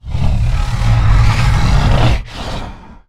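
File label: Stealth Attack Start; Evolve; Goliath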